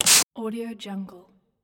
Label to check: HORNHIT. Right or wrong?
Wrong